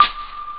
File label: PLICK, DRM PERC; Groovy Bytes: Behind the Moon